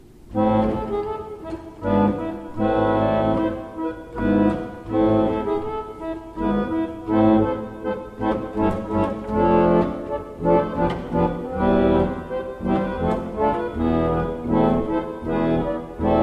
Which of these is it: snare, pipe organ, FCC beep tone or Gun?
pipe organ